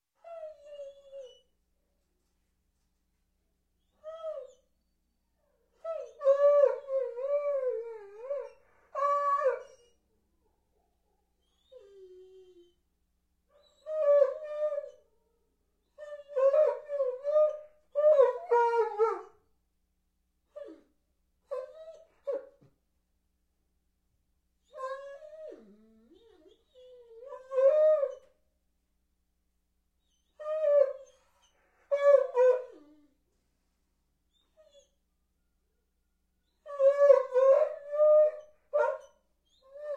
Doberman Moan; Sound Ideas; Series 2000

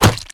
Ranged Physical Heavy; Kilta

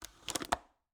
Cabin, window, latch, flip, lock, close, hyper; Eiravaein Works; Vaeyan IV

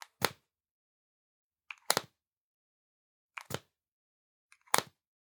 Generic Click Plastic Small

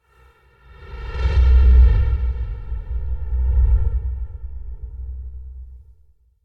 Whoosh Deep Feedback Air Whooshes; Hollywood Edge; sound designer's toolkit v2

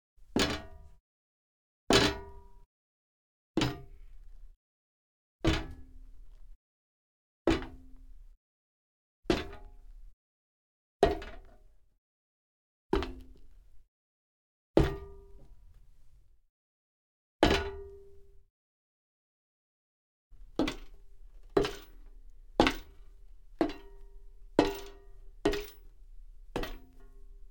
Metal Grate With Rattle, Foley Footsteps; Sound Ideas

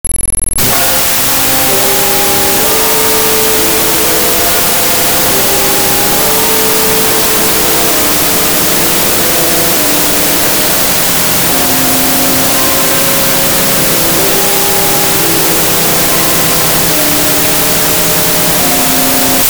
Bell Tower; 1001 Sound Effects; Foley

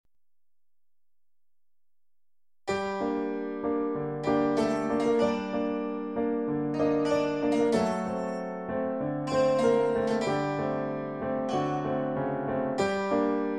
game over; BLIND QUEST - The Enchanted Castle

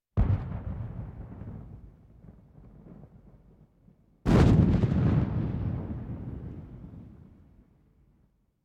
Military vehicles

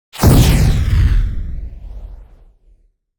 Explosion Blast Heavy Short Detonation; Hollywood Edge; sound designer's toolkit v2

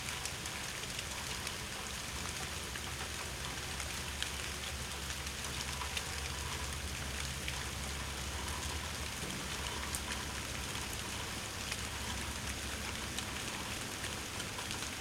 rain, ambience; NS Studios - ultra sound collection

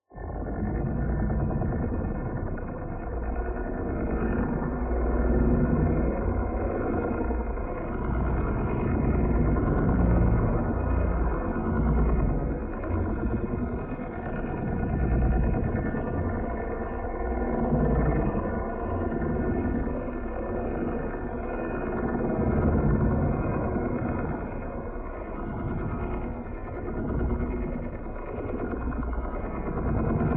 AMBIENCE Outer Space Factory; boom library; Matter

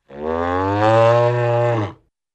Single Cow Moo; Cartoon; Hollywood Edge; Cartoon Trax

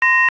Sci Fi Beep; 1001 Sound Effects

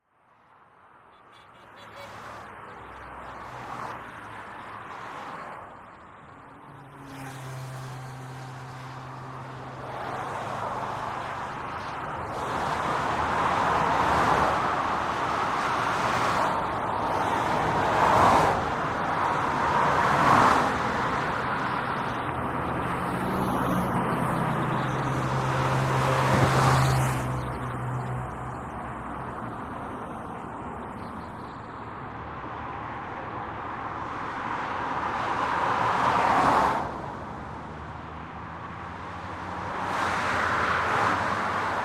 ring road; Pitch Black - A Detective's Demise

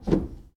Foosball Forward Rotate

